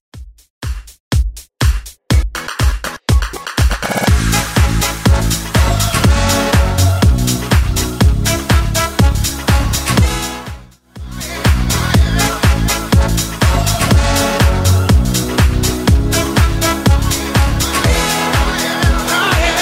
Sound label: Top40 Redrum 122bpm